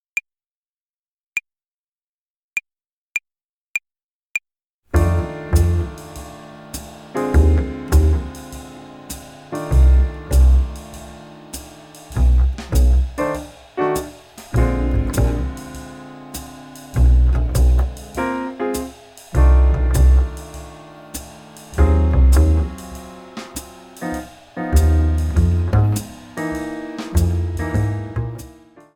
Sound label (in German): Blues-Tonleiter Übung 1, Play-along; Jazz Club Bass